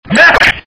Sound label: bahaheh